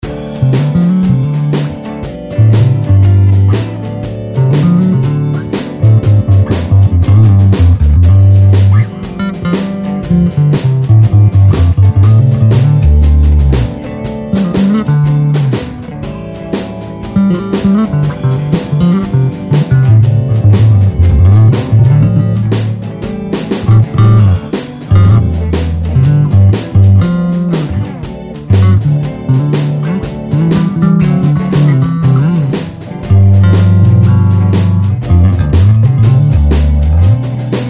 Chicago Blues In D 120 Solo Bass; Blues Solos

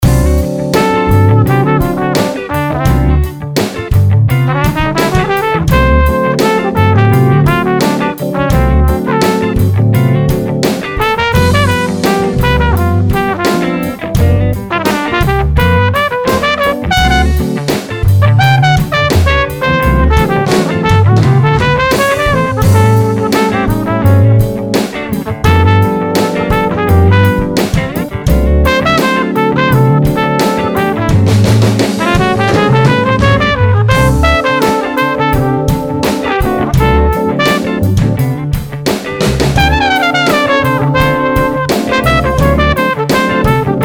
Jazz Funk 12 Bar D 85 Solo Flugle Horn; Blues Solos

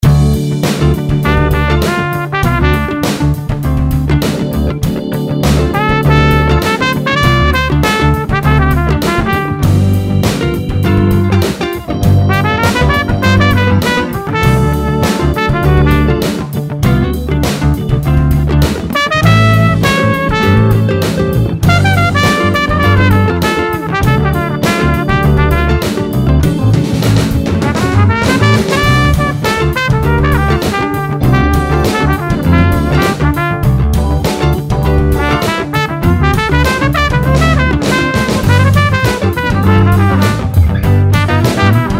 Funk It Down 100 Solo Trumpet; Blues Solos

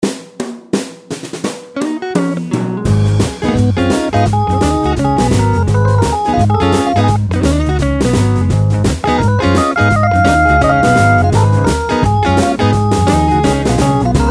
Blues In Ab 85 Solo Keys; Blues Solos

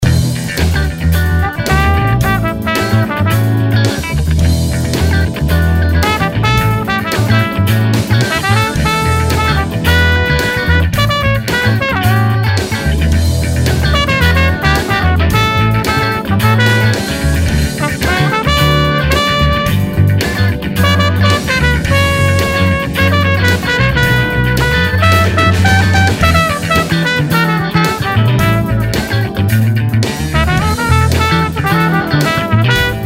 Groover In E 110 Solo Trumpet; Blues Solos

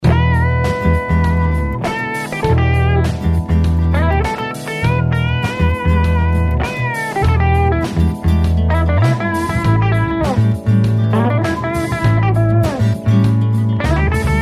Blues Funk In E 100 Solo Guitar; Blues Solos

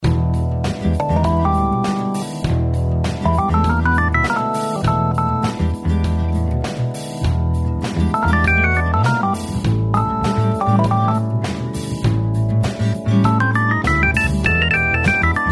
Blues Funk In E 100 Solo Keys; Blues Solos

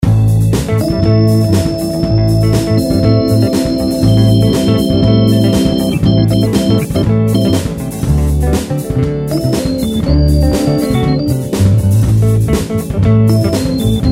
Blues in A 120 Solo Keys; Blues Solos

